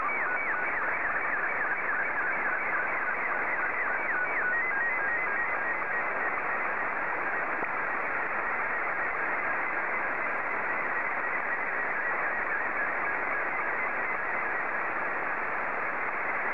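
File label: SSTV, Scottie 2; JK7BUM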